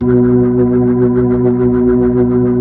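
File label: B-3, Hammond B-3 organ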